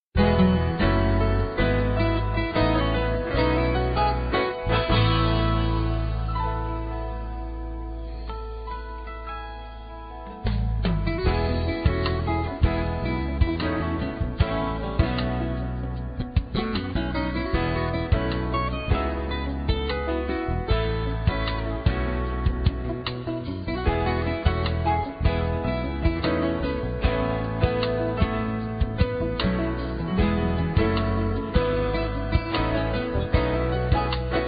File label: Easy Listening